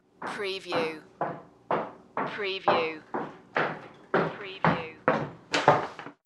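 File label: High Heels Wood Floor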